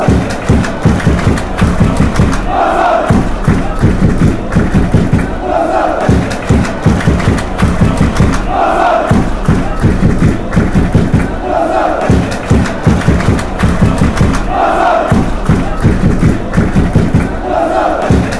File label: club brugge chant